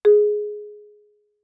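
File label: Marimba cord fortissimo Ab2; Percussion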